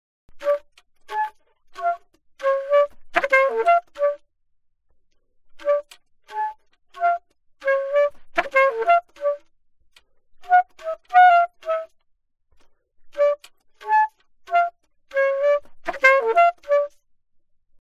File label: altosax